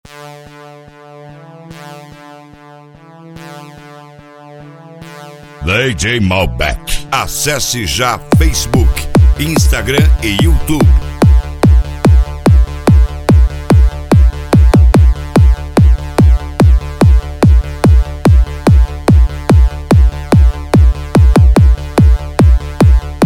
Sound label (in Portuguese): som automotivo; gauchinho som e acessorios e magno